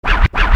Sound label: Scratch, Hip-Hop